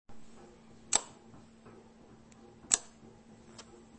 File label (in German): Lichtschalter